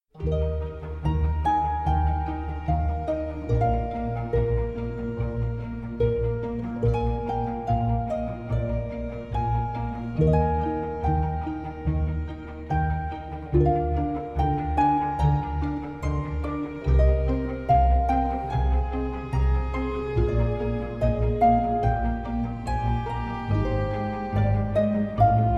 Violin Concerto in F Minor, Op. 8 No. 4, RV 297 Winter II.; Vivaldi; The Four Seasons; Largo